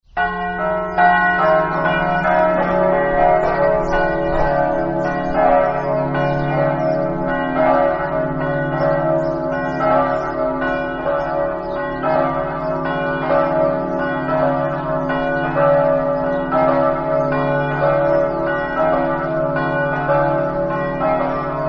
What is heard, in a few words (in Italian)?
campane; s pietro